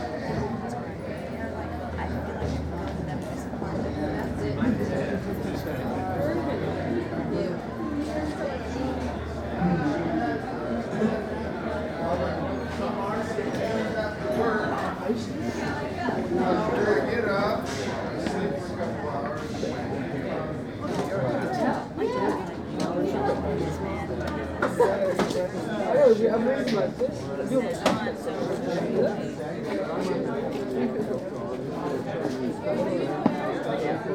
CROWD BUS IN MID; SIMUZENSOUND

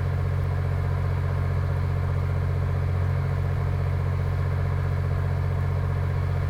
ENTITIES CAR LOW; SIMUZENSOUND

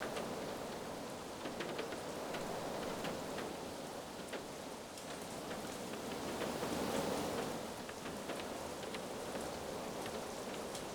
SNOW MID OUT; SIMUZENSOUND

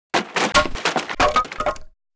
COLLISION WOOD; SIMUZENSOUND; COLLISION MATERIAL

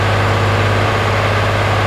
DRIVE MOTOR; SIMUZENSOUND

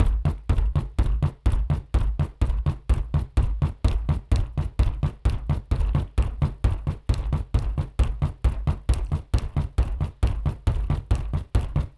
WHEEL; SIMUZENSOUND

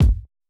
COLLISION BASS LITE; SIMUZENSOUND

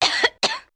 COUGH WOMAN; SIMUZENSOUND

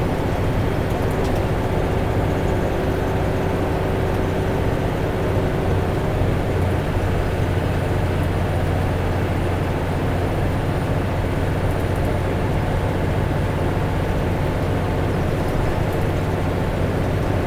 DRIVE CABIN; SIMUZENSOUND

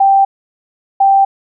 PARK SENSOR; SIMUZENSOUND

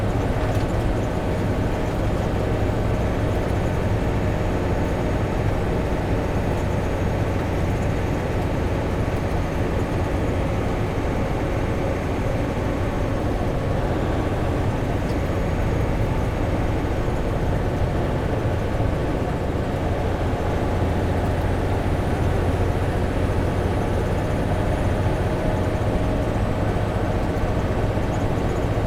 DRIVE CABIN; SIMUZENSOUND

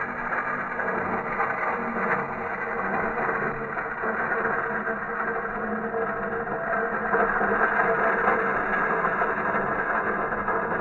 SNOWPLOUGH; SIMUZENSOUND

